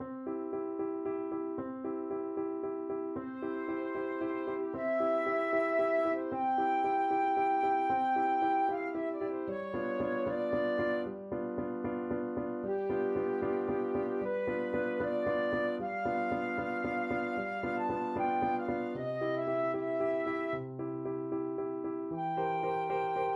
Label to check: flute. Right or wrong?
Right